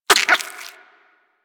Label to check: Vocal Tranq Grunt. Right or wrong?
Right